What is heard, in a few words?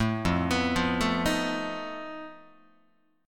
F 7th Flat 5th F down Guitar; F7b5; arpeggiated; 0,1,2,3,1,2